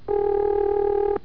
ring

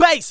bass vox

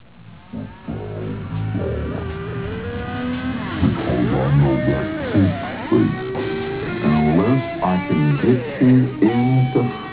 pigs, riffs; Climax of pigs

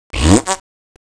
fake fart

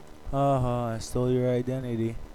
haha

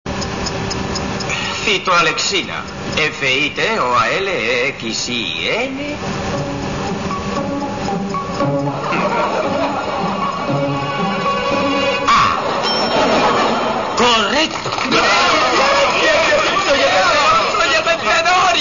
win

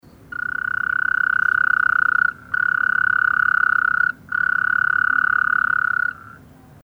Taipei Treefrog; Rhacophorus taipeianus; call

